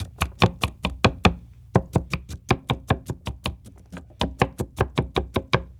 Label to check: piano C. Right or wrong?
Wrong